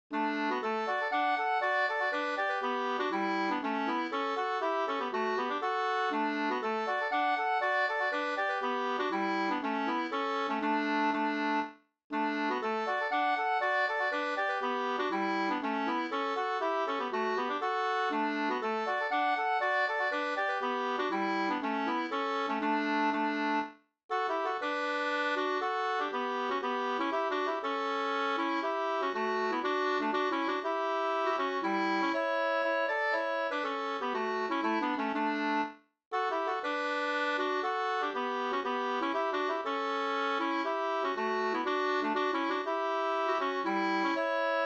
polska senpolska; Olof Abrahamsson An

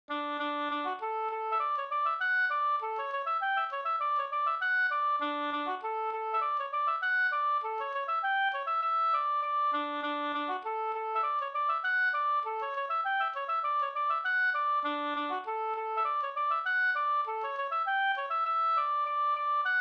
engelska; fr Stigtomta

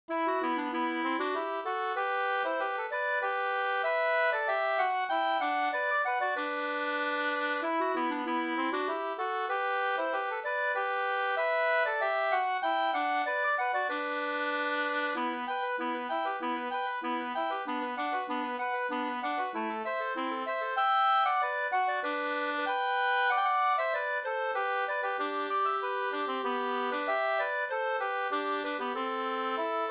slangpolska 2st; Lastringe latar

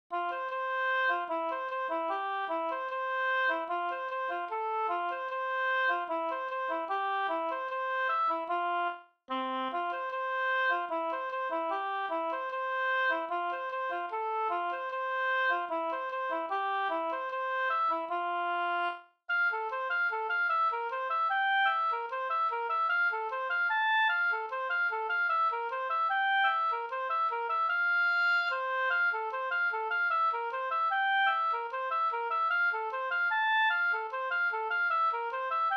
folkdans, Valsvariant fran Bayern

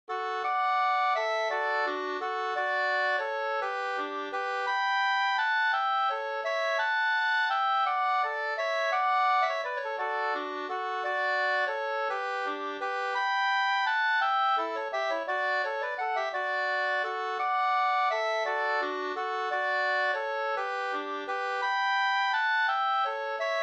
vals

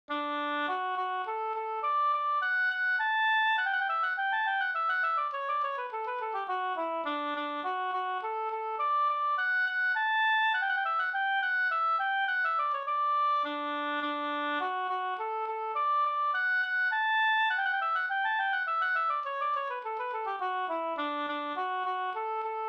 slangpolska D dur

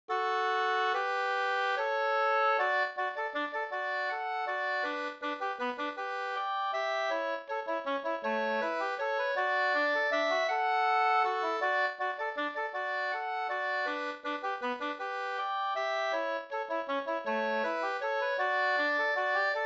folkdans; Ankemannavalsen Bh 2st

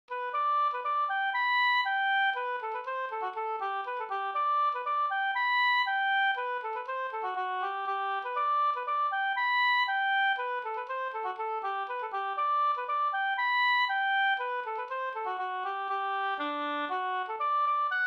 stjuls; e Pekkos Helmer Bingsjo

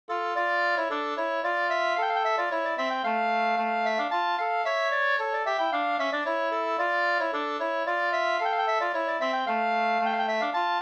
polska Senpolska

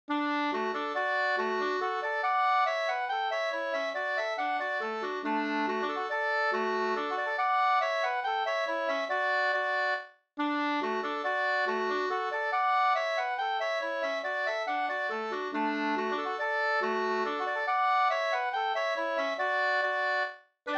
mazurka, 2st

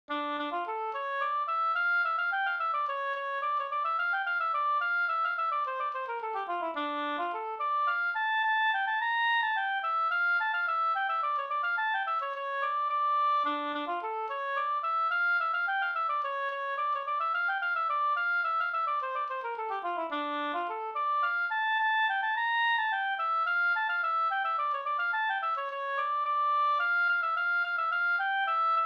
slangpolska

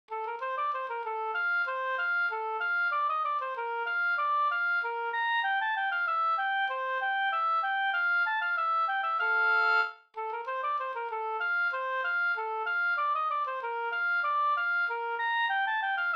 kadrilj; e Bjorkstrom Overselao Sd

